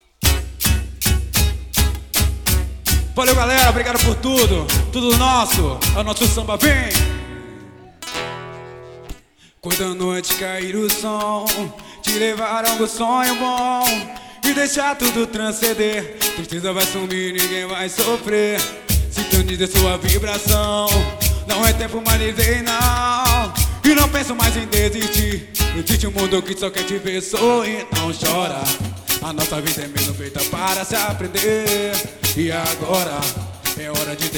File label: reggae